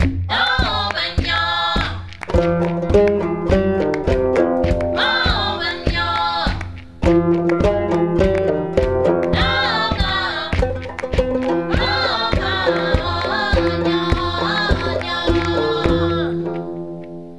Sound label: chorus fretless banjo percussion